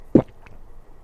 gulp; animal

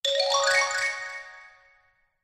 wipe

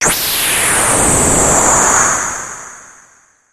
warp

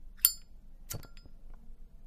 lighter; operation

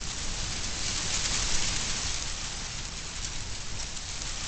rain

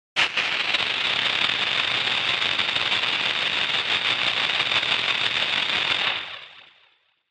sparkler

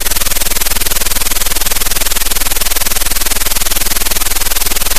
spin

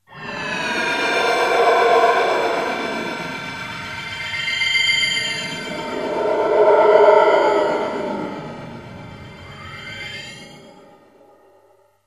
magic

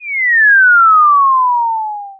fall

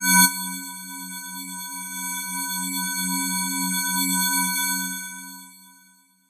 beam

